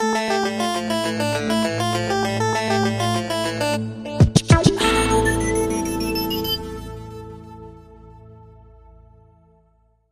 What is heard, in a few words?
Sonic R&B Groove